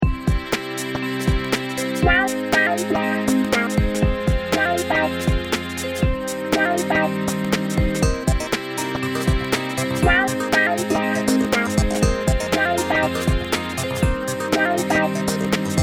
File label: common chords